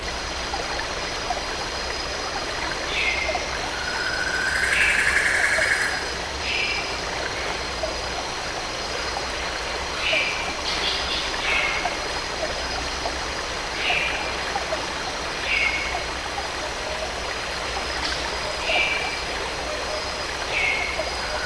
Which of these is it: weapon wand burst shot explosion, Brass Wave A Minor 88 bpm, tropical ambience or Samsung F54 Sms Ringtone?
tropical ambience